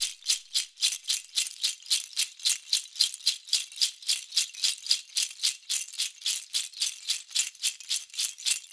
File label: rattles loop, 110; perc construction loops; SEISMIC Drum Sample Pack; Slate